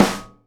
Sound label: SNARE, Live Snares